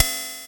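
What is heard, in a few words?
psr hat open, Yamaha PSR drums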